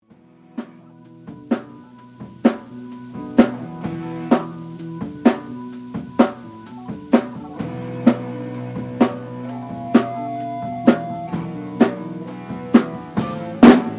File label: Heartbeat